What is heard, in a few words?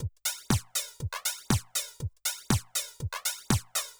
House Drum Flanger